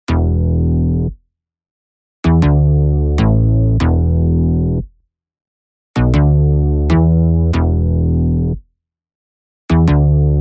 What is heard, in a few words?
Stereoizer Bass